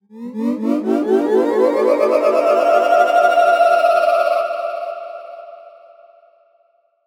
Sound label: magical pulsating ascend; sound design; zapsplat